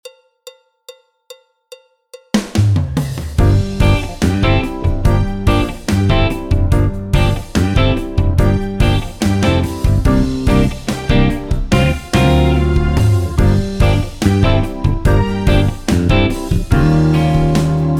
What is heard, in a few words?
Good Mates all instruments; Jazz Syllabus Level 2